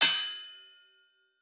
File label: Blacksmith; Fantasy Sounds Bundle; Craft